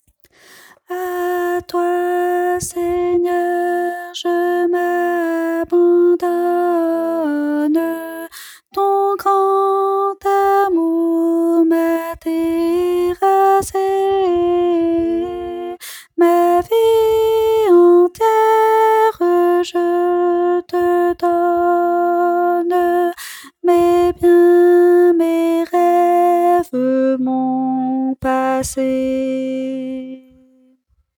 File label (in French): alto